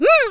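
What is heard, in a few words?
female jump; FlyForInsanity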